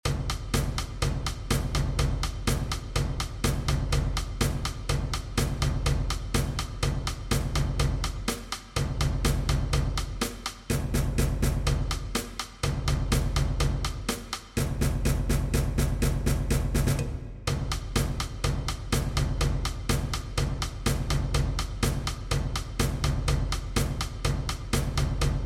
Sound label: perc